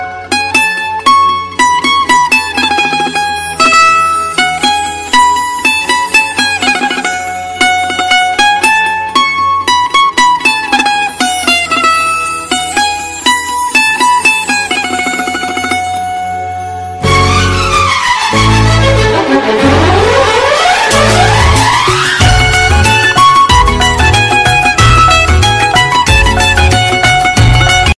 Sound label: top love sweet music ringtone, bollywood ringtones